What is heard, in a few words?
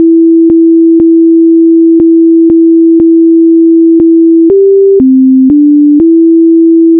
bells